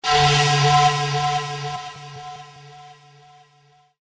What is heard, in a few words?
panflute; crysis warhead